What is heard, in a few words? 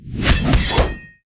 martial Flourish; heroes vesper